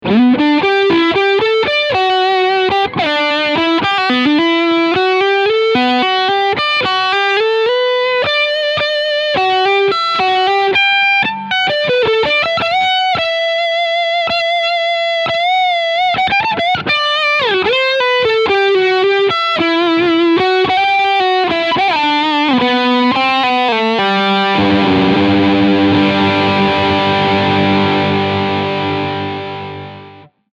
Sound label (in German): Leadsound Steg PU